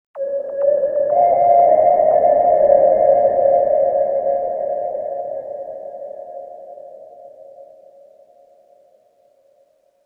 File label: Oneshot Synth; zombie army 4 dead war ps4 stadia switch windows xbox one gamerip 2020